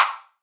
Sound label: Perc